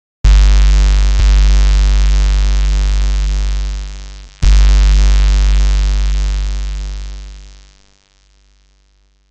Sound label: classic coin pickup chiptune